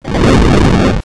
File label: fire